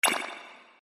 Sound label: fortune option